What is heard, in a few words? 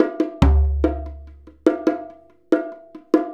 Bonga; Drums; Bongos; Essential Percussion